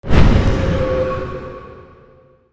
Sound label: spell poison; archer